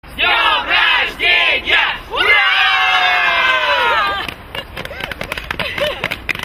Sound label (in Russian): s krikami s dnem rozhdenija gde i muzhchiny i zhenschiny pozdravljajut